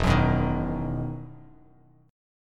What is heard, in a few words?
E Major 7th Suspended 2nd E down Bass Standard; EM7sus2 strummed